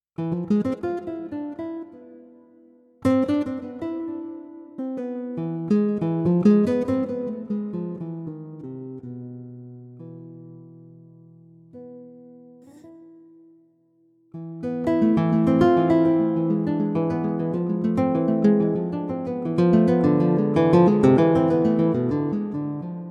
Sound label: Spanish influence, guitar; Pianoteq 8